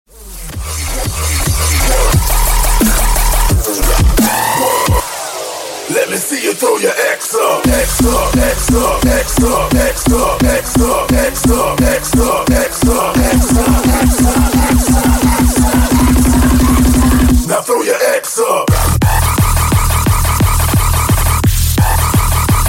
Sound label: iphone x up ringtone